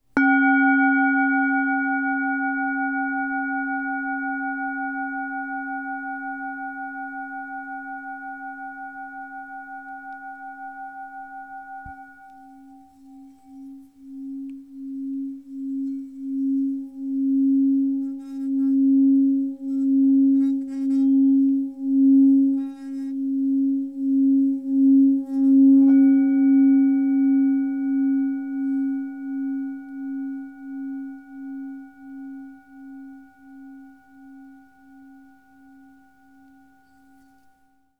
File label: Db 270 HZ